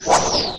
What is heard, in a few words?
CHASING SHOT